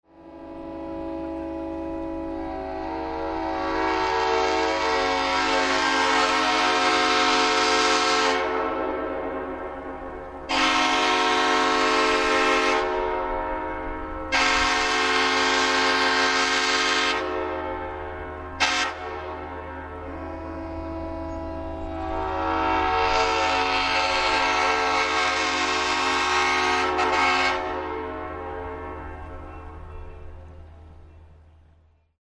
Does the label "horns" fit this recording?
Yes